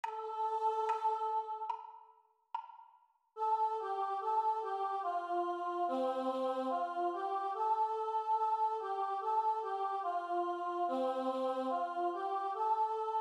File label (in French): Chant Vx Seule